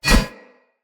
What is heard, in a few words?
Curved Sword Whoosh Right Left; Weapons; Player; Swords; Sinecure